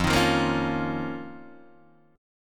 F 7th Suspended 4th Sharp 5th F down Guitar Open D